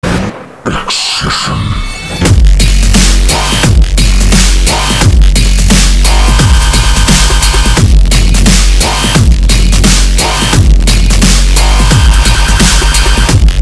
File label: zm dnb